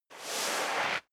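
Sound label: step dress boots sand slide long, footsteps; schritte; menschen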